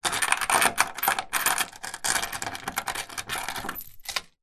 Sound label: door chain lock; household